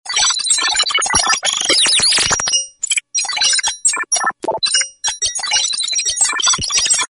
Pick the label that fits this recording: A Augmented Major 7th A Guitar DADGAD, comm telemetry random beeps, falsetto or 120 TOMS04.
comm telemetry random beeps